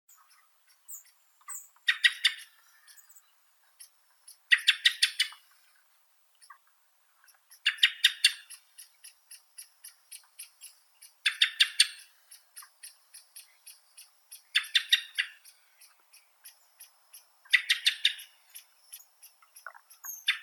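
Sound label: black-throated thrush, Turdus atrogularis; drozd chernozobii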